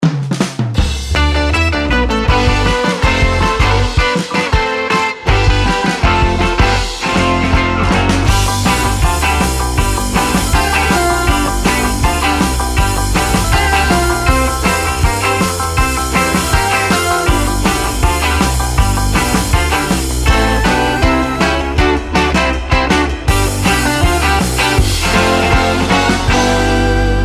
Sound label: BEAT MAKER